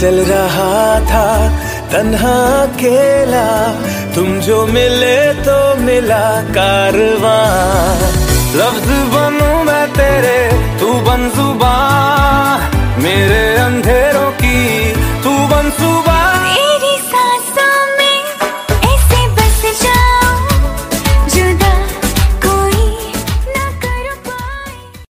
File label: ma bollywood ringtone